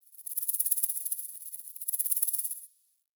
Forest Creature Rattle High; Warframe; Grineer

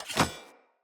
Cleansed Spear Fast Equip; Weapons; Player

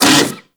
Kubrow Armored Vocal Pain; Warframe

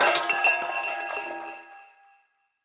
Corrupted Battle Dog Bell Swing Long; Foley

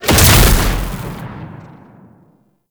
Fulmin Prime Proj Explosion; Weapons

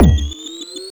Alt Proj Pre Explosion; Crp BE Plinx; Blastor Pistol

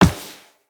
Walk Metal Perforated, Footsteps; Operator

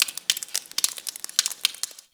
Ice Thaw Drip; Warframe

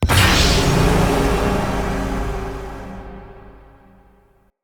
Pollution Tower Face Destroy; Encounters

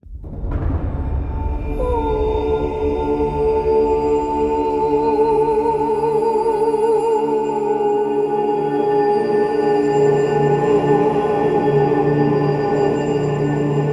Login Music; Login Screen; Void Eclipse

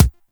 Weapon Punch Pistol; Weapons; Pistols; Warframe

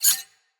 Sword Slice Accent; Weapons; Garren Sword

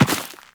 run dirt, footsteps; Warframe; Teshin

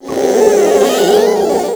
Proto Infested Ancient Strike Scream; Warframe; Abilities

